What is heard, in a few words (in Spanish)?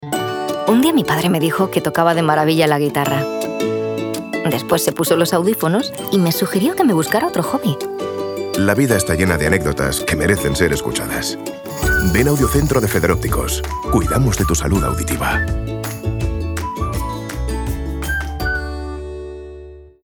CUNA GUITARRA GENERICA; AUDIOCENTRO; FEDEROPTICOS; andalucia